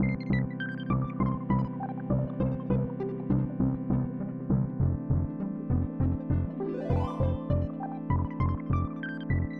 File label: ending; adarkroom